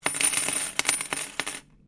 Coin Penny Multiple Drop Laminate

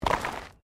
Body movement Slide Gravel

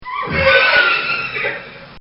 Horse Scream; Animals; Bobby Cole

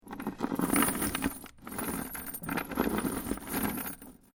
Heavy Chain Drag Along Wooden Floor; Chain sounds; Metal Sound Effects; Heavy Chain Drag Along Wooden Floor 02. Part of the LYN104 package of Chain sounds.

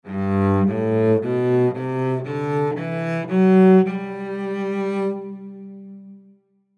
Cello Scale Hold End; Strings; Blastwave; Sound Effects:Musical

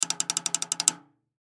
Drum Snare; Snare 14 Inch Pacific Rim Shot Drum Roll Clicks Slow; Drum Kit Sound Effects; Blastwave

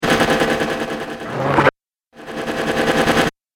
Dubstep Scifi Effects

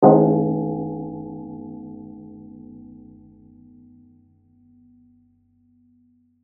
Church Bell Ring, Music, Church, Bell, Ring; Bells; Musical; Blast Wave

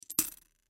Piggy Bank Coin, Piggy Bank Coin Drop In Full; Money (Sound Effects:Objects); Blastwave; Foley

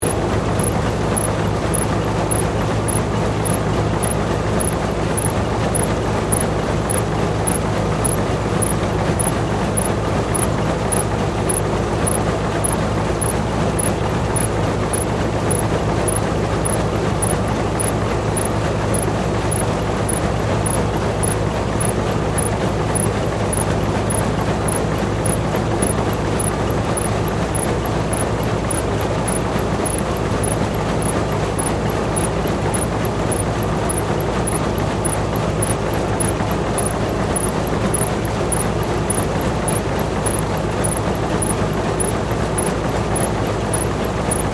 Conveyor Belt; Conveyor Belts (Sound Effects:Machines)

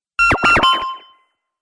Droid Correct Bleeps, robot sound effects; Sci-Fi Robot Sfx; Andrew Fleming

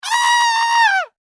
Horror Scream Male; Screaming; Voice

